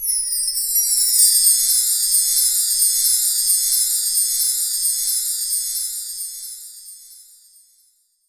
Wind Chime; Roland M-OC1

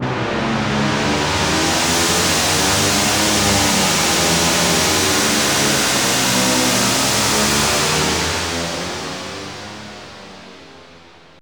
jet, synth jets; Zero-G Ambient 1

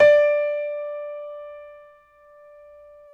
PNO Grand Pno; Club-50 - Foundations Roland

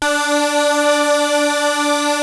Prophet 5 D5, Synthesizer; Hollywood Edge - Giorgio Moroder Rare Synthesizer Collection

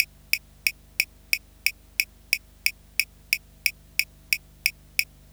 Cowbell Loop; Sonic Foundry (Sony Creative Software) - Fluid Dynamics Drum & Bass; Construction Kit; Percussion

